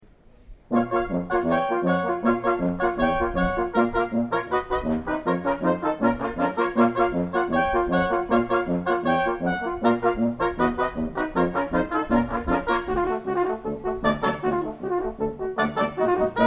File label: Brass Quintet - Galop